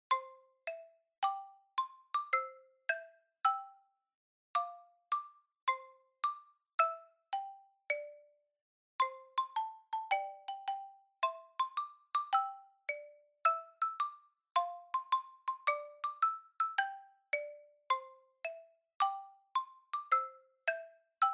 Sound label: Shuffle; Oshogatsu